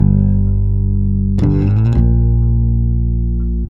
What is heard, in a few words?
REAL BASS, BASSLICKS; Ueberschall; Jam Box